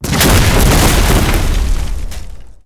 Explosion Wood; 1944 Battle Of The Bulge

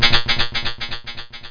Echosilver; Action Dome

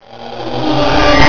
Warp; Centaurian

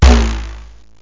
bendir, arabic

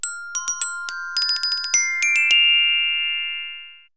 o bells, orchestr; KABOOM! - Wild and Wacky Sound Software; music

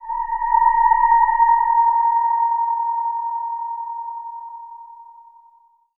SPHERE, Ambient; eJay

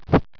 Bat Flap; AddamsFamily

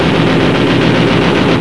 20mm; Achtung Spitfire

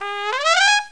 Trumpet

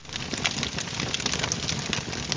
crackles; alienbreed3d2

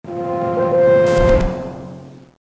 arena

